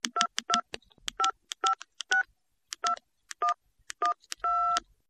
Dialing Beeps And Clicks

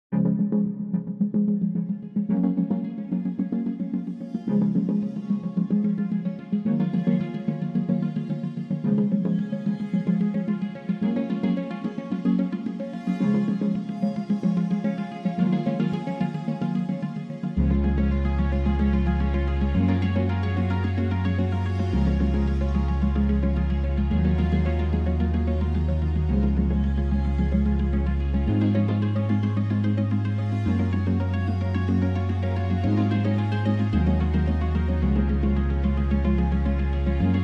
Synths

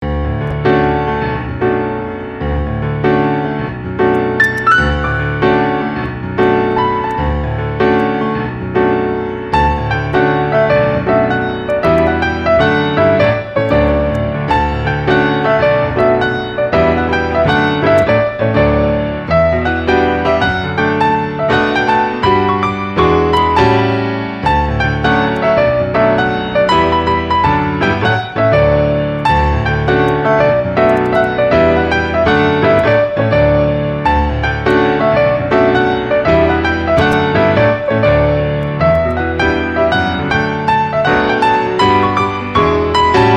Deck The Halls, without Jingle Bells

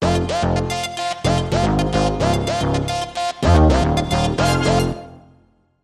Sting No Drums

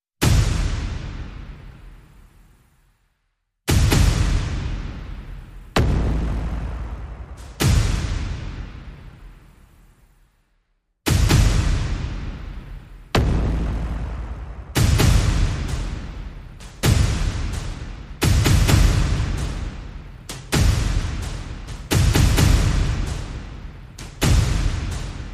Percussion Only

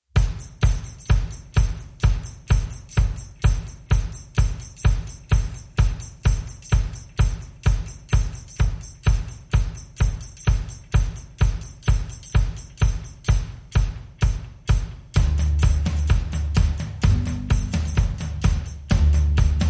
Drums and Bass

